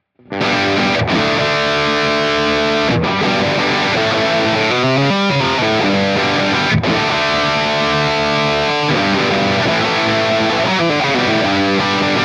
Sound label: Hardcore Dutch